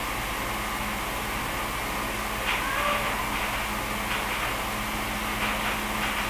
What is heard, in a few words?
Kitchen Scream; Occidental